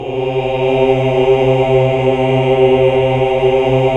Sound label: OOH. OOOH Uni